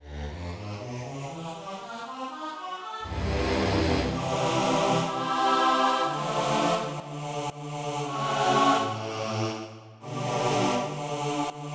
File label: World Vox; SoundFont - Traditional, Voice & Keys - Volume 2